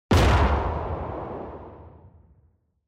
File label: Cannon